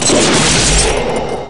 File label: Eject Plane Seat Launch; Airplanes